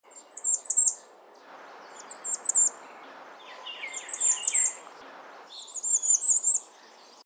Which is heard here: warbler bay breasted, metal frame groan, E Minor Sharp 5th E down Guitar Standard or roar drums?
warbler bay breasted